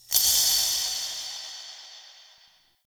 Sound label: Interior Orbital Station Metal Shake